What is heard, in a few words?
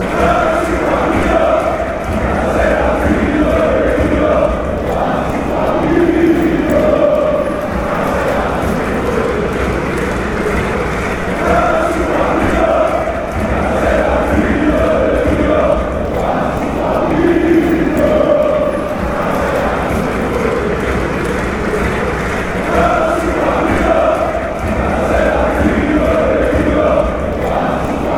panathinaikos chant